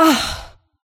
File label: Grunt; Lewd SFX